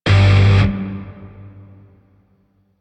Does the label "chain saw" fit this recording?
No